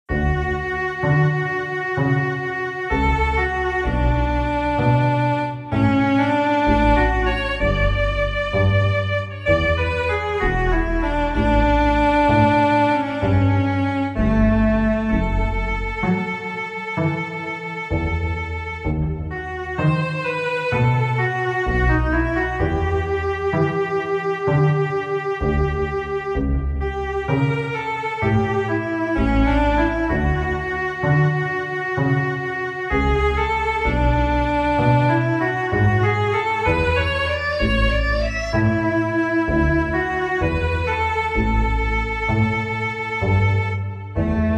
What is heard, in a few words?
a short piece of violin